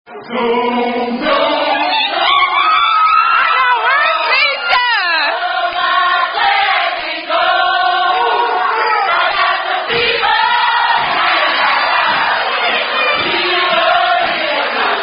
Fraternity Chant; Alpha Phi Alpha

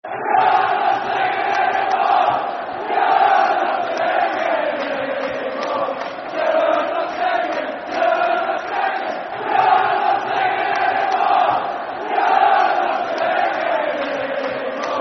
Football Chant (Soccer Songs); You're Not Singing Anymore; Falkirk Ffc

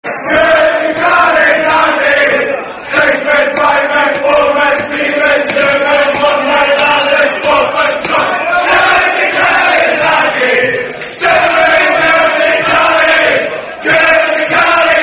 Football Chant (Soccer Song); Ten Men Couldnae Carry Nade; Hibernian Hfc

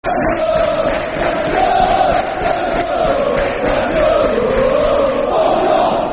Time To Go, Football Chant; Soccer Songs; fanchants; Huddersfield Town Htfc

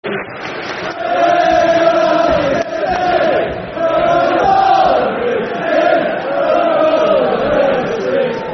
Football Chants (Soccer Songs); Wigan Latics Fc; Wigan Wafc; fanchants

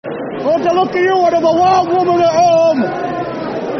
Football Chant; Hudders Funny 1; Huddersfield Town Htfc

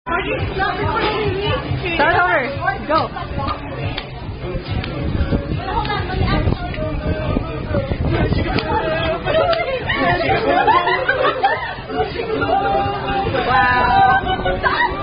Boom Chica Boom Boom, Children's Circle Dance; Children's Circle Dance Related To Korean Non-Drinking Bunny Game

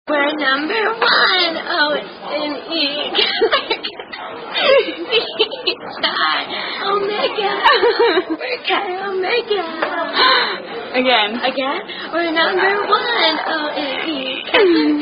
sorority chant; We're Chi Omega

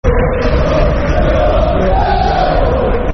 Football Chant (Soccer Songs); Millwall, Millwall; Millwall Mfc

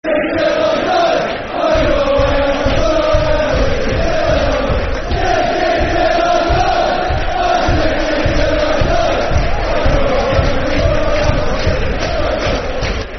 Football Chants; Cardiff City; fanchants-com

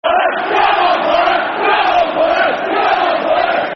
Come On Boro, Football Chants; Middlesbrough; Middlesbrough Mfc

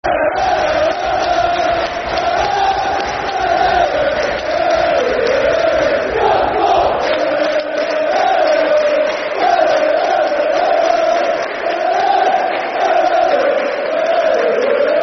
Football Chant, Soccer Songs; Der Der Scunthorpe; Scunthorpe United Scfc

